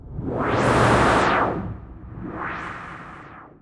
Swept Noise

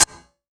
C#, synth line; 90 s Sample CDs; NiceBeats; SynthLine VOL-3

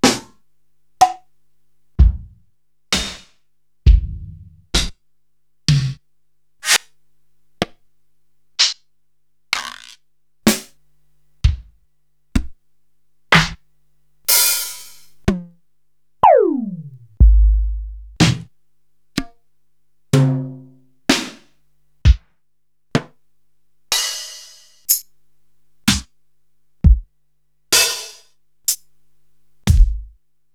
Korg TR-Rack, Samples Sliced; m8

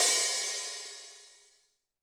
HiHat & Crash, Roland TD-20; HiHats

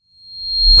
REV, SOUND EFFECT REVERSE

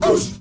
MALE WAR, Heart of Africa, shout

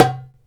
DUMBEK; Drums & Perc; AKAI S6000 CD-ROM - Ultimate

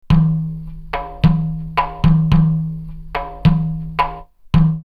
Tunis 108, drumloops World & Ethnic; X-Static Goldmine

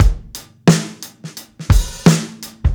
SWINGY, breaks, drums; Basement drums vol 2

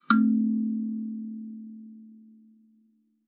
KALIMBA A3; Xsample VOL-7

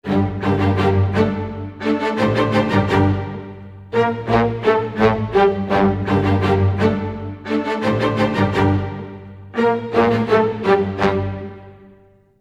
g min full mix, Orchestral arrangements; PowerFX - Downtown Orchestra; 85 Gmin

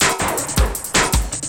LOOP L, Drum & Bass 160 BPM

